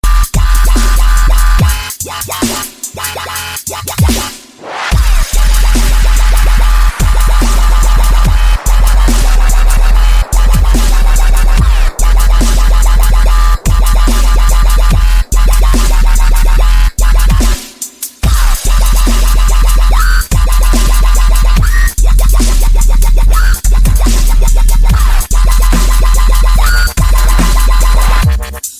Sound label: dubstep